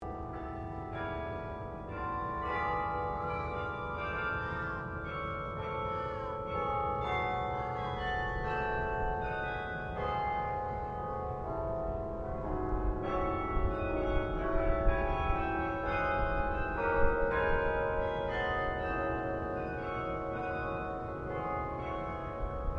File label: Carillon Canberra tune played; Carillon in Canberra; Bells